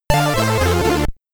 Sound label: Stage Clear (SID Stereo); Ninja Commando; Commodore 64